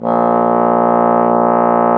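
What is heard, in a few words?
bass trombone; Miroslav Vitous - Solo Instruments VOL-1; 90 s Sample CDs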